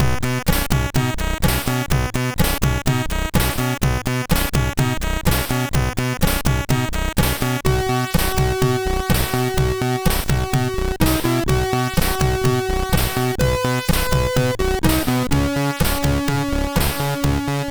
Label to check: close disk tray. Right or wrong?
Wrong